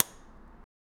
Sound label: hitmarker sound, gaming